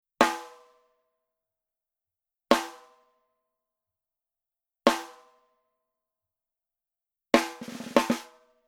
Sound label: SNARE Coated Rim Shot